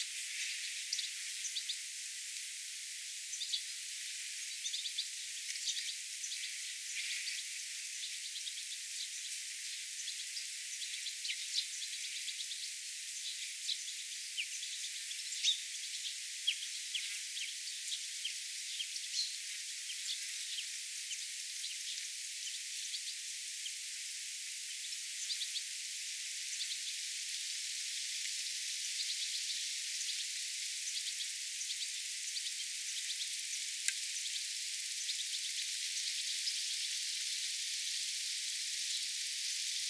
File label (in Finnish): nuori tikli ja nuoria peippoja